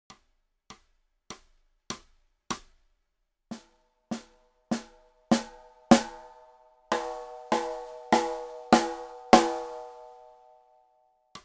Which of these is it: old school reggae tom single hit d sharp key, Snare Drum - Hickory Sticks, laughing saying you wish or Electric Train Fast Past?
Snare Drum - Hickory Sticks